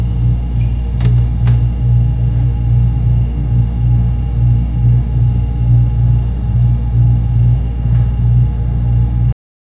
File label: old fridge powers on start; appliances